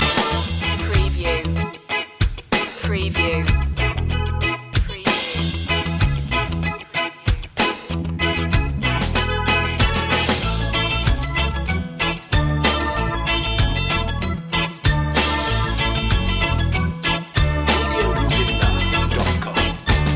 rock steady loop

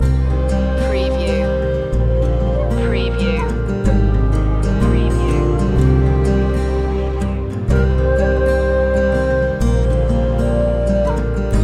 sunny autumn loop

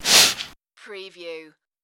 creature vocal

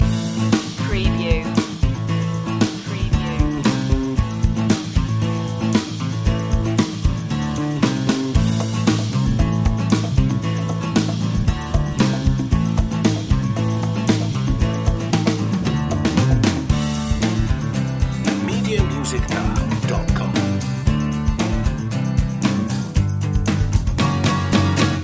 country road chase loop

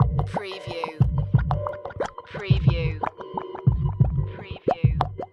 Hip Hop Drum Loop 90 BPM - Sparse Without Vinyl

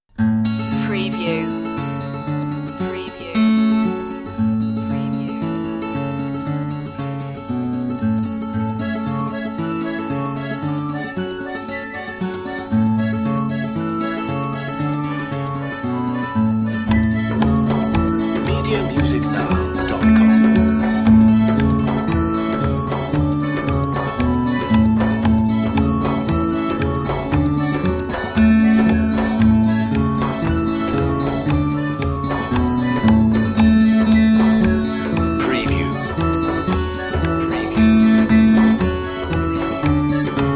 dancing druid no bagpipes

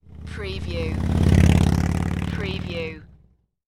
Motorcycle Harley Davidson Drive By Center

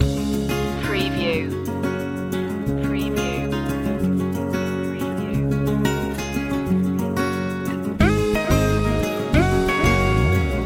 country road trip loop

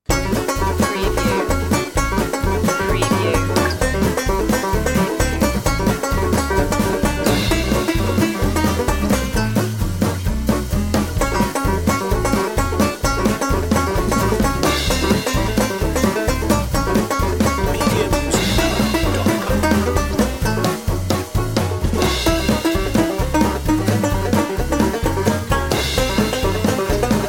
stepney swing

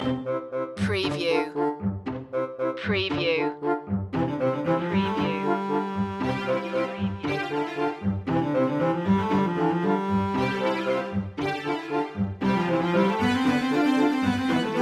market square loop full

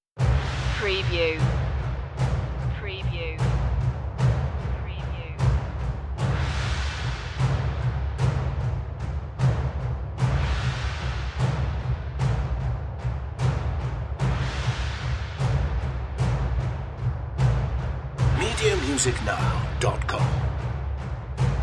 sons of odin perc

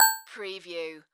Multimedia Alert

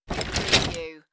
Box of Christmas decorations being moved; beanstalk audio

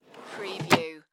Drawer Open Wood; Sound Effects Bible

